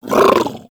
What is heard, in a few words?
Vocal Tranq Dart Grunt; Warframe; Hunting Critters; Legendary Kubrow